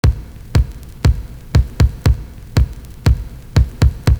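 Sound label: NOIZY bass drum, LOOP; Univers Sons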